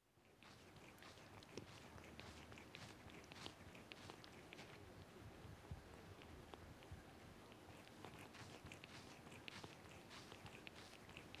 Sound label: Cat Washing; Cats; BBC Sound Effects Library